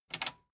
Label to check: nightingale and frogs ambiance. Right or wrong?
Wrong